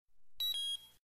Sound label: Health blink; mgs2